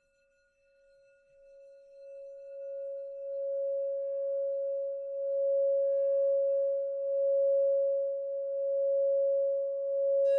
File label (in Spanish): BOWL SI; sonidos de cuarzo; escucha y armoniza los chakras